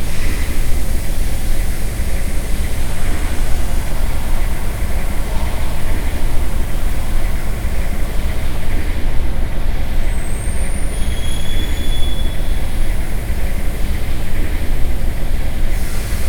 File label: cryo huge machine amb; Quake 4 Alpha